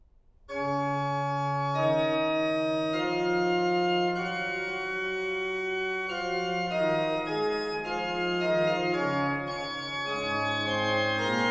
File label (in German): Fuge C-Dur; BWV 545